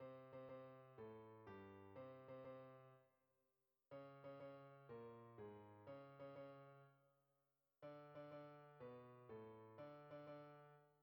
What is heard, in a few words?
tune; Omni-Play Basketball v1.0; Mindscape; music